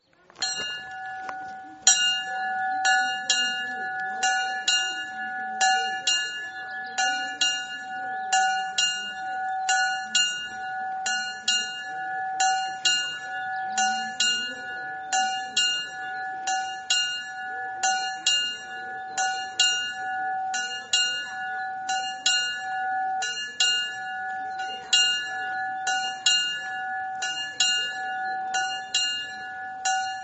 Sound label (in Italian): campane